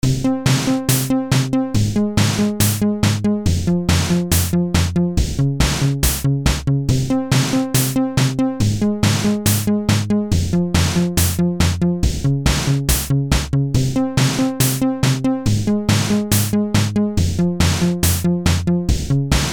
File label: downwards techno